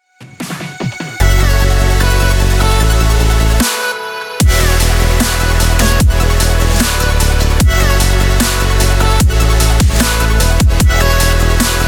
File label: powerful intense dubstep